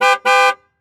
Car Monte Carlo Exterior Horn Beep Multiple; transportation

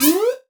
Multimedia Internet CD-Rom Flash Pop Up

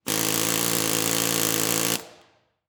Industry Tool Air Hammer Run Long

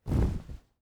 Cloth Drop Blanket On Cement; household

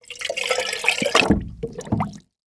Liquid Water Water Dunk Watering Can

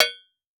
Impact Shovel Hit By Small Metal Pipe

